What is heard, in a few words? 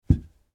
Desk or service bell place down on desk